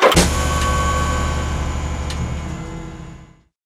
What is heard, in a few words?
respawn turret deploy